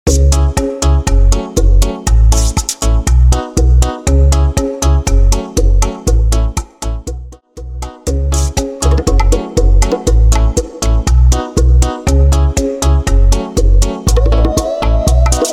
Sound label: Bachata To Moombathon 120 To 100 BPM; transition; moombahton